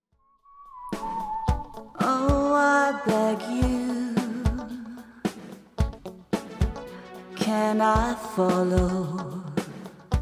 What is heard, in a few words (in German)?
ohne ukulele